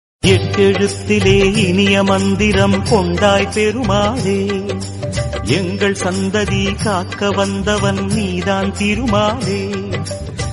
TAMIL GOD PERUMAL RINGTONE

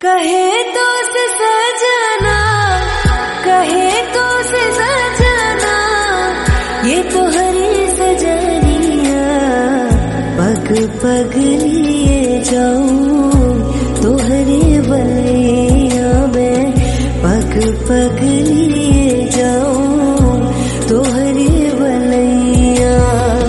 LOFI RINGTONE

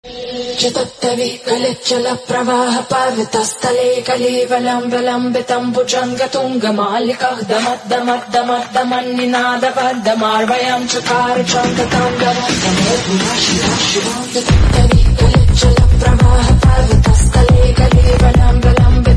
OM NAMAH SHIVAY TELUGU RINGTONE

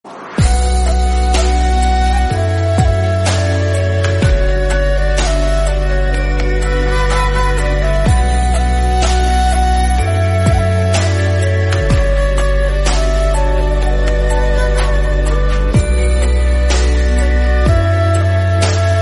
instrumental ringtone

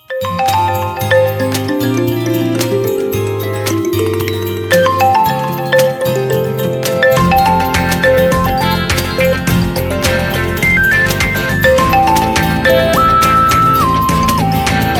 VIVO REMIX RINGTONE

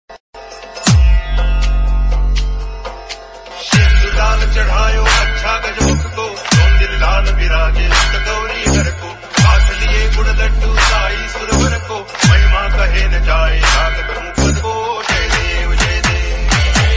Marathi ringtone

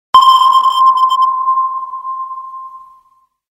SONAR PING LOUDEST RINGTONE

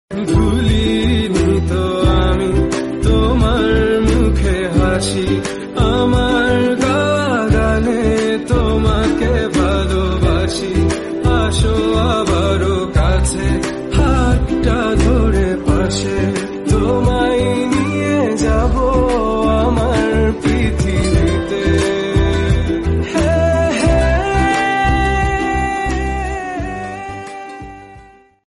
BENGALI SONG RINGTONE

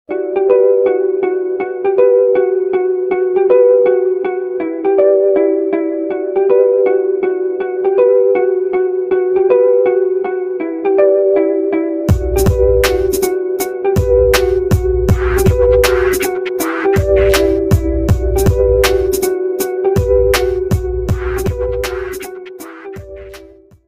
URBAN BEATS SONG RINGTONE

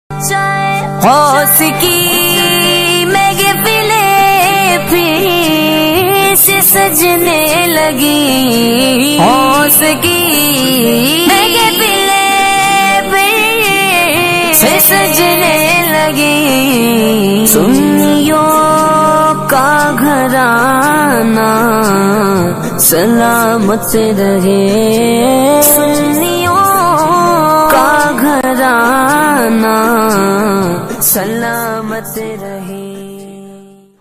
NAAT RINGTONE

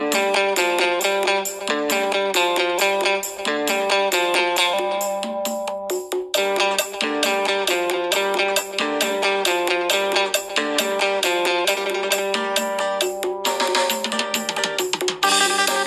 thai flute music ringtone

